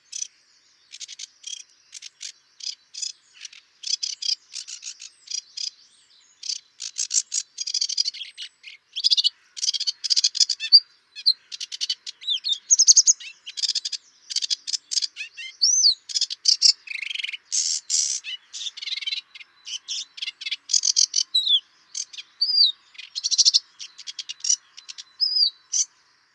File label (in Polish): rokitniczka rietzanger zang; peter boesman